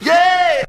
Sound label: "yeah" SUNG